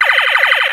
TOY BEAM, TOYS

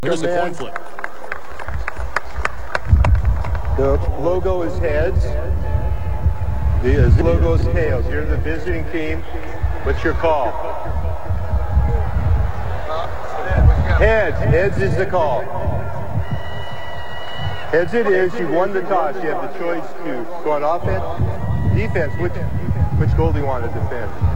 coin phlip

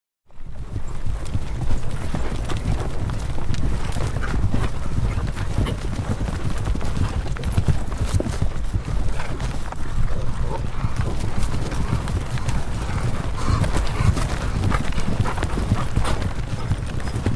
50 Horses Canter By; Historical; Hollywood Edge Historical Series; War Horses Armies & Weapons

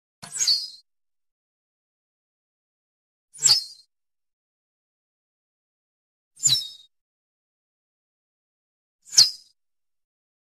Arrow Whistle Bys Medium Arrow Zing By Close Up; Hollywood Edge Foley Sound Library

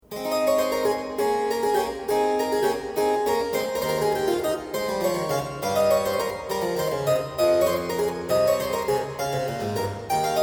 Suite in A - Minuett, Keyboard Music; Colin Booth; William Croft

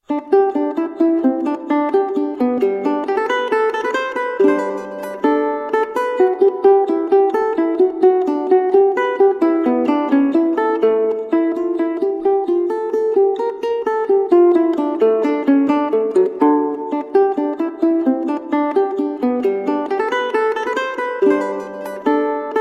Gigue from Cello Suite no1, Solo Mandola; JS Bach; BWV1007

